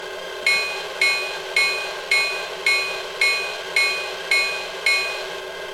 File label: Diesel Bell Only